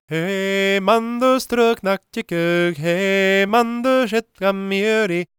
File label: GAELIC, World Voices; ILIO; Vocal Planet